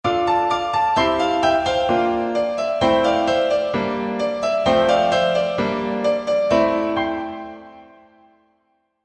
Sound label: piano sms